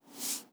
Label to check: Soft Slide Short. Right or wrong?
Right